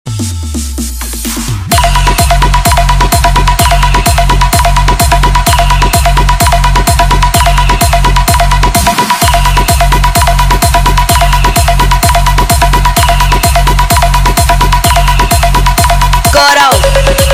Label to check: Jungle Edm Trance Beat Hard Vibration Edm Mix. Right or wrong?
Right